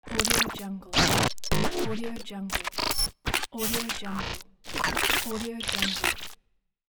glitch pack; bogdanbogdanov

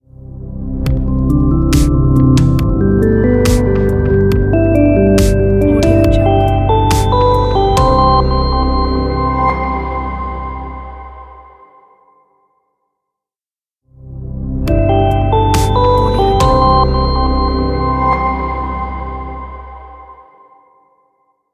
opener tech ident

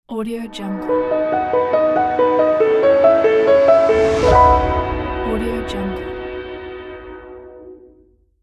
classic logo

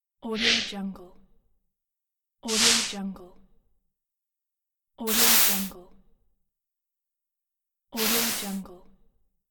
stepping on snow; efxideas

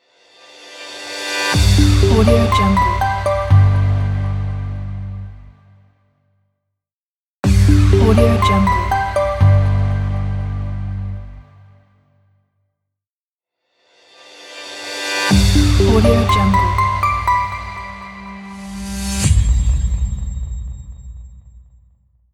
elegant logo; alexshulgin

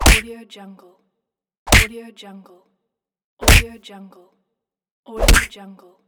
classic punch

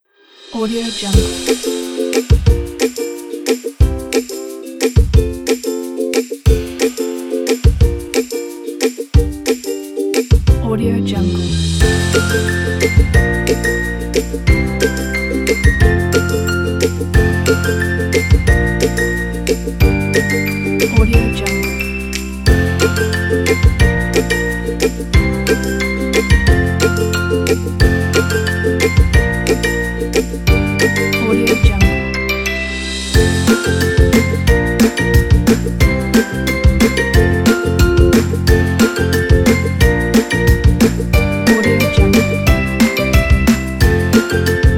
happy friends; brownbird

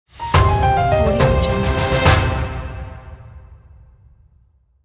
game over logo

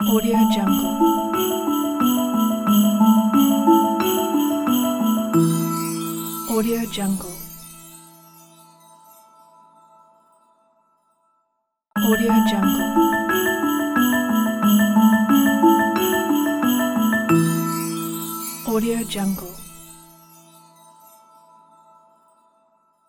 christmas logo

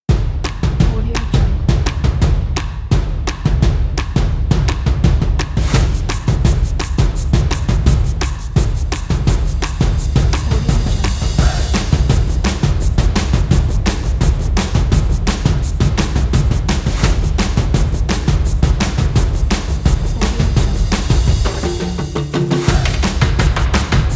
drum beat percussion; mrmastermind

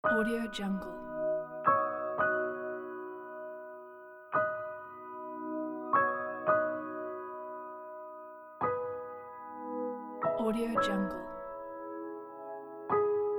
peaceful ambient

